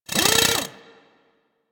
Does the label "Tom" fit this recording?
No